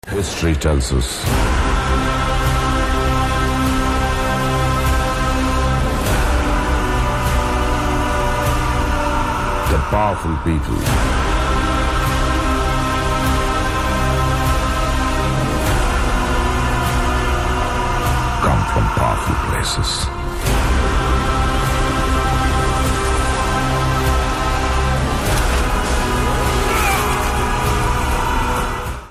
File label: Teaser Background Music; KGF Chapter 2